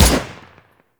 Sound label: Grineer Emplacement Shot; Weapons